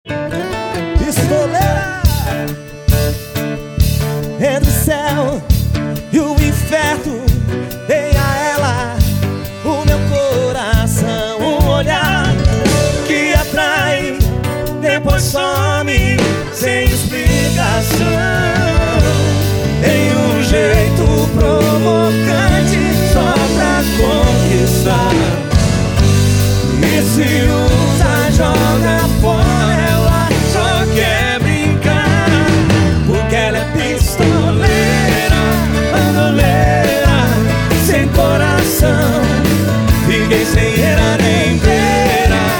sertanejo

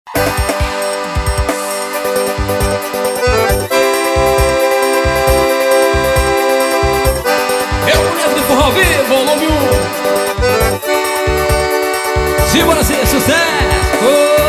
forrozao

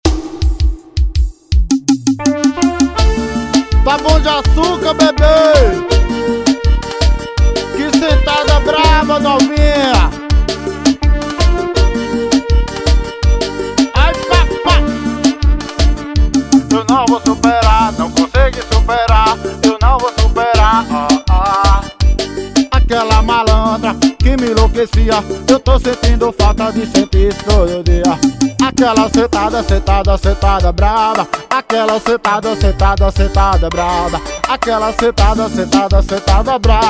piseiro

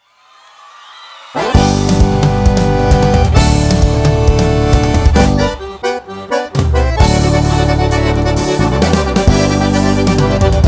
abertura

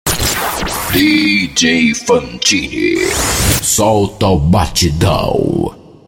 vin, solta batidao

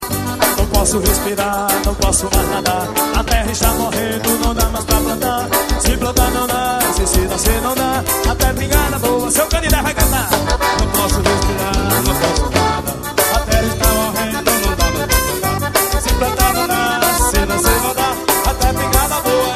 acordeon xote

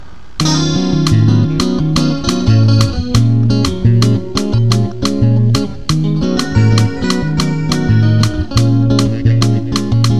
bossa

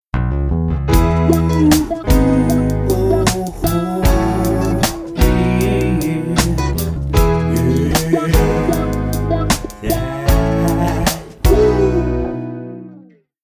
trilha sexy bass groove; trilhasparafilmes

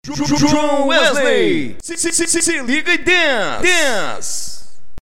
vinheta

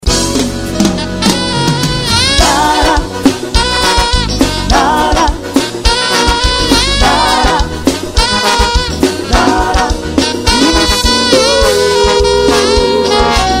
forro, ao vivo

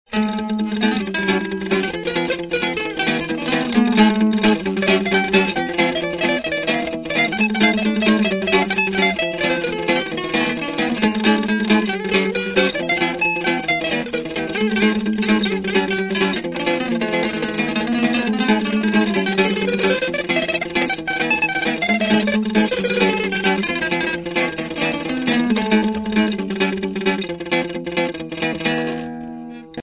improviso

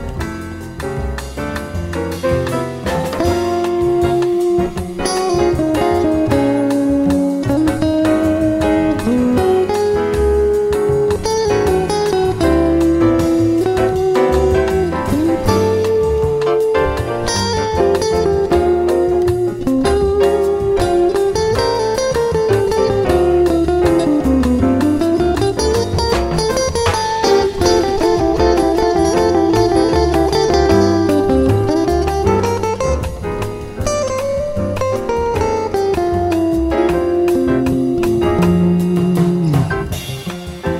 jam limpa da gt

